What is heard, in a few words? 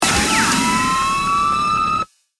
Hit Burst